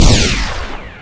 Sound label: laser; marine